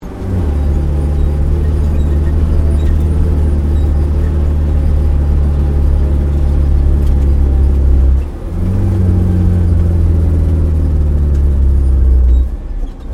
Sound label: APC FV603 Saracen Manouver Interior, British Saracen Light armoured vehicle; Trucks (Sound Effects:Vehicles)